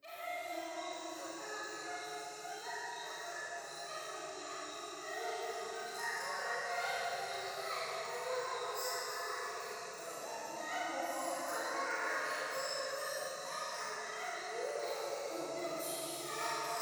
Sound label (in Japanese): 廃墟 喘ぎ 山奥 夜